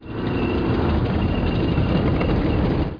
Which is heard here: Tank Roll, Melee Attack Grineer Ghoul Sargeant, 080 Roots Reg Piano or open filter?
Tank Roll